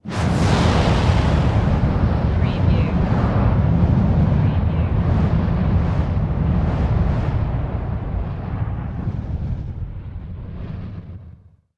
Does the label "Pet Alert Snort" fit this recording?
No